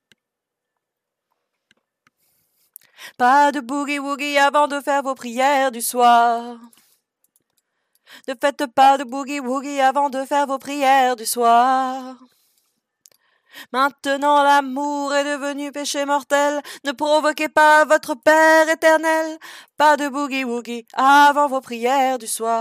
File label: tenor